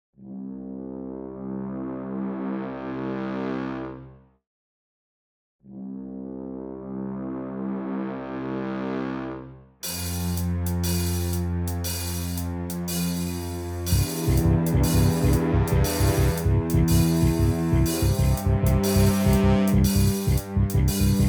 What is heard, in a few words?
sneaking around